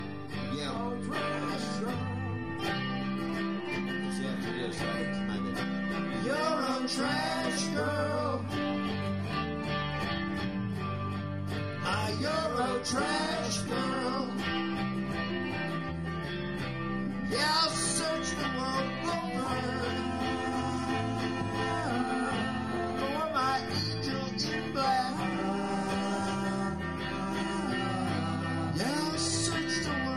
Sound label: Eurotrash lead practice